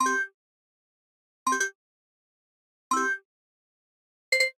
Digital Click Positive

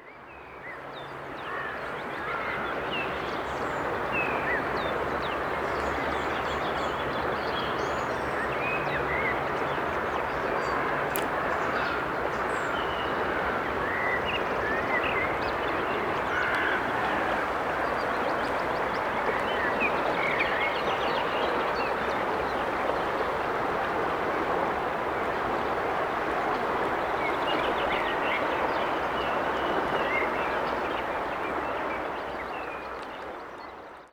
birds insects river; best service Blue Box

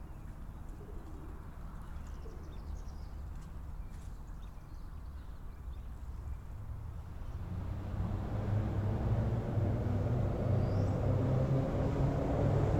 Suburban traffic light grated bridge birds; urban and suburban; boom library

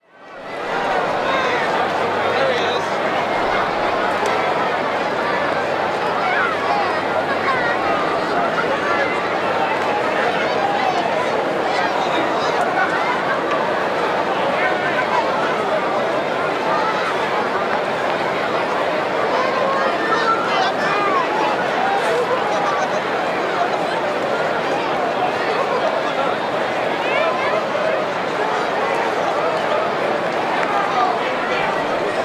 Crowd Outdoor Large Stadium; crowd walla; Sound Ideas; Walla Series

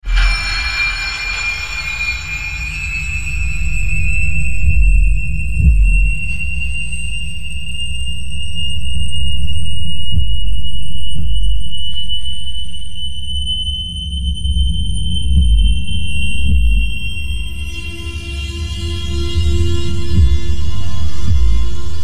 Metal On Metal, Tention Makers; RoosterFX

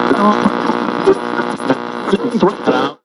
AM Radio Tune; Communications; 1001 Sound Effects

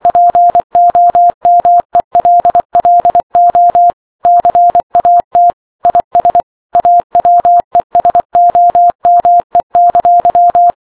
Morse Code; RadwinIsland

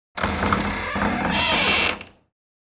Creak Wood; Inquisitor 2

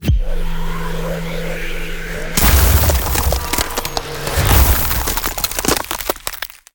Ice Spell Damage AoE Icy Vortex; Arctic Aura

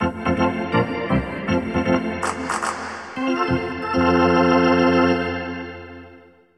Hockey Organ

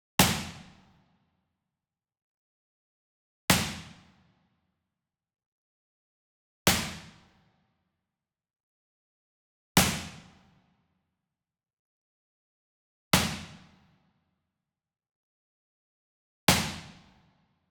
Sweetener Whip Crack Resonant Hit Medium; boom library; Cinematic Strikes Construction Kit

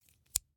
Scissors, snap, snip, shear, small med, single; Eiravaein Works; Vaeyan IV

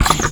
Menu Scifi

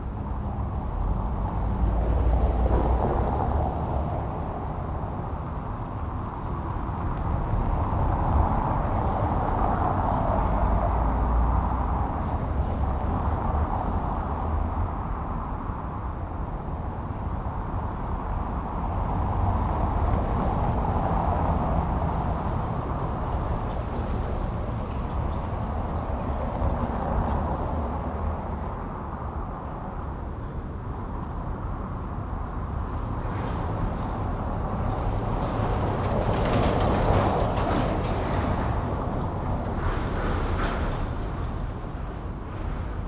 Suburban traffic cobblestones; urban and suburban; boom library

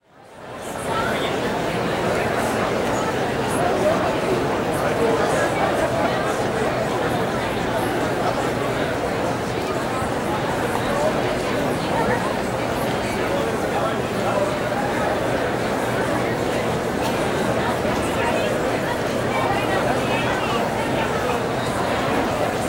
Crowd Indoor Busy Event Public Hall; Walla; Sound Ideas; Walla Series

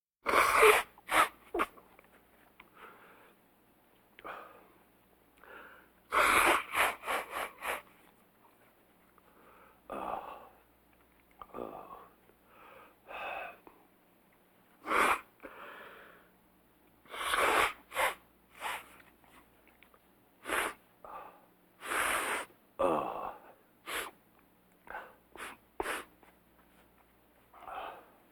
Vocal effects breathing Moaning Baby

